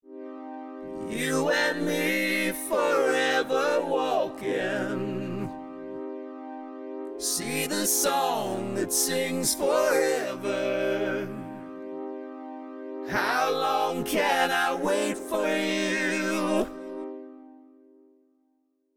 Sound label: Harmony; TC-Helicon Perform-VK Demo